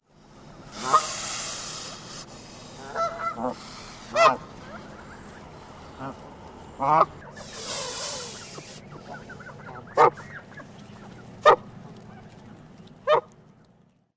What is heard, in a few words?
adult alarm hisses amp barks